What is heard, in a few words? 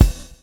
swing